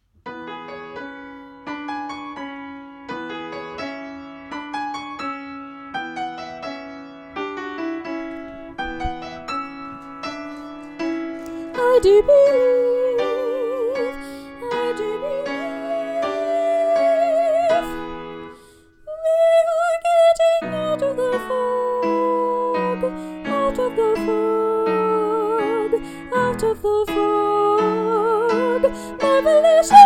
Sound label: out of the fog soprano